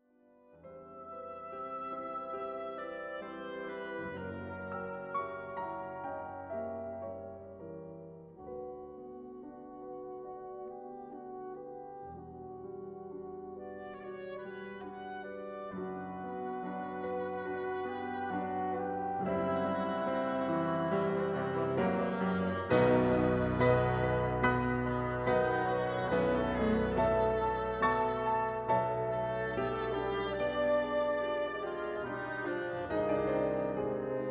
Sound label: piano wind; poulenc